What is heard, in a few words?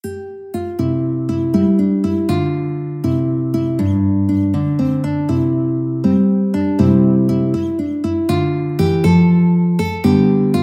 guitar